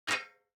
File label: DICE on Metal, Grab, Standard, Two Dice; Samples; ArticulatedSounds; DICE Sfx Party (Extended)